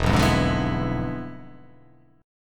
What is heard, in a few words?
E 7th Flat 9th, down